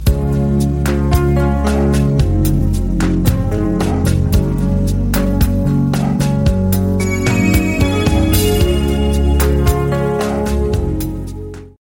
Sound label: romatic flute